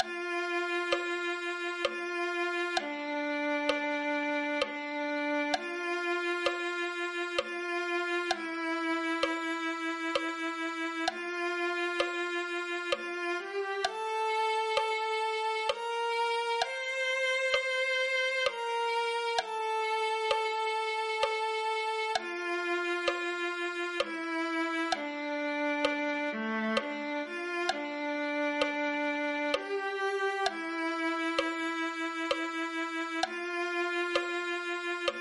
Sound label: viola